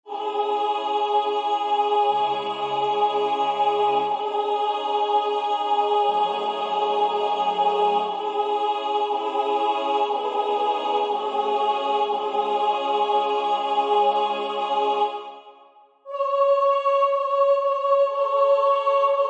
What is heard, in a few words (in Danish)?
sopran 1; Peace I Leave With You